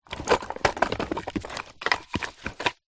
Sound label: leisure toys wooden and plastic movement rummage inside kids bag